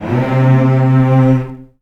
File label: Virtuoso Strings, R; ILIO; Kirk Hunter Virtuoso Strings VOL-2; 90 s Sample CDs